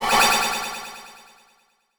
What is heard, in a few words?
Title Transition Delay Short